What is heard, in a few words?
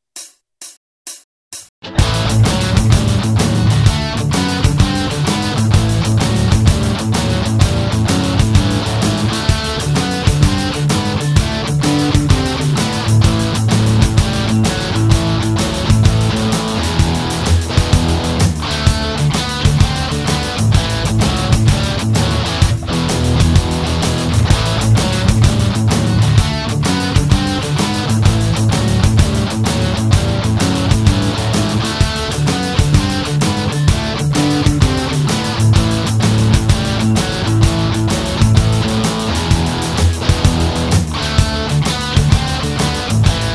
blues crazy lazy jam